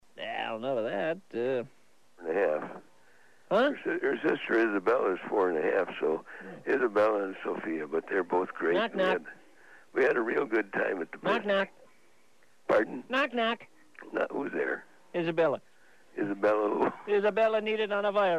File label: knock knock